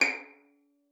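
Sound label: violin pizzicato D5; Xsample VOL-5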